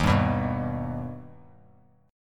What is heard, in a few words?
D sharp Augmented D sharp, chord; D#+ strummed; JGuitar